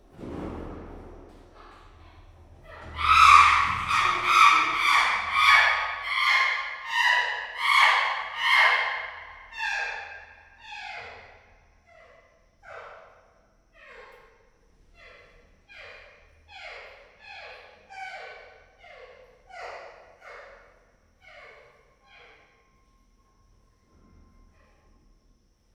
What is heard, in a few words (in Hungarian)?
figyelemfelhívás, csimpánz; győri zoo 2014